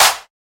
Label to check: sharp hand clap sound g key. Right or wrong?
Right